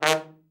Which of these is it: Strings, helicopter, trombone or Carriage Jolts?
trombone